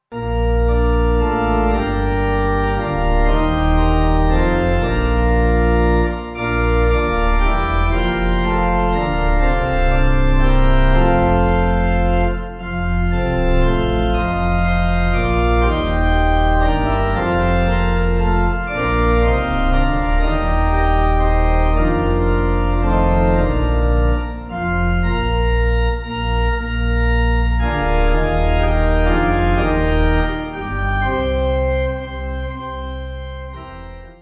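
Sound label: Pipe Organ; God Of Our Fathers; Sannox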